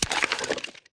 wpn re45 reload magpull; Titanfall 2

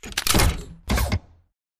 bt combat idle to backpedal; Titanfall 2

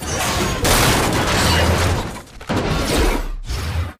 atlas melee pilot extract throw; Titanfall 2

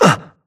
grunt hits; Titanfall 2

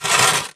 Stalker Damaged Footscrape Long Concrete; Titanfall 2

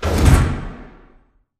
sliding door sm verb open stop; beacon; Titanfall 2

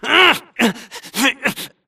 grunt Struggle; Titanfall 2

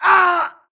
grunt death quick; Titanfall 2

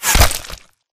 bullet generic head kill shot human; Titanfall 2

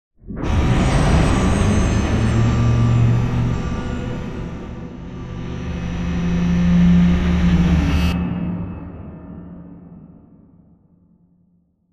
Giant Floor Panel Exit On Track Servo; Boomtown; Sideways Town; Titanfall 2

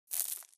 imp bullet lightballistic dirt debris; Titanfall 2

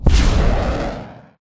atlas dash close; Titanfall 2

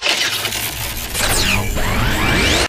spectre overload close; Titanfall 2

